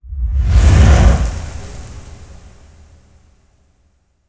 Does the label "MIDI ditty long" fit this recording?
No